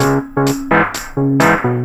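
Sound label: JUPITER LOOP, Techno Trance; E-MU Formula 4000 Series Vol. 2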